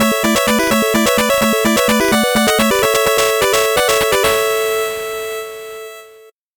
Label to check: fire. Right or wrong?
Wrong